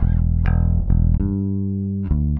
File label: Electric Bass; Basses